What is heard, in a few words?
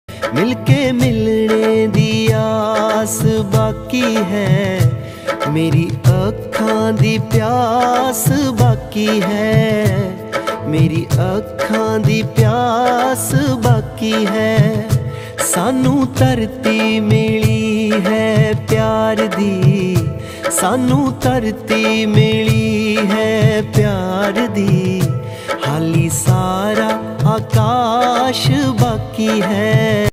Jatt Punjabi Ringtone